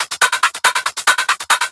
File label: Loop 140 BPM; vengeance essential club sounds-3